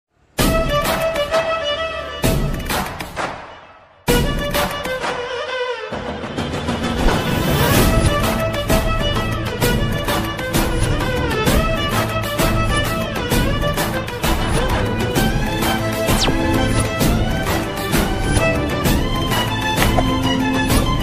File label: love bgm ringtone